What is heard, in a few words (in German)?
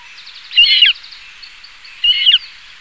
rohrweihe